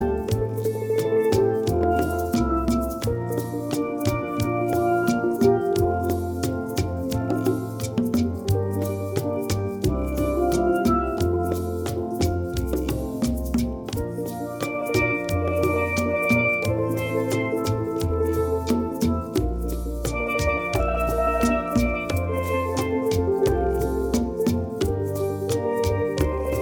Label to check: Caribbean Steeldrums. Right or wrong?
Right